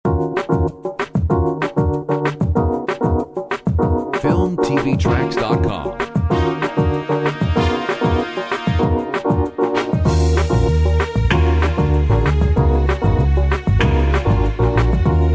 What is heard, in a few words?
Brazilia Lounge; Filmtv-tracks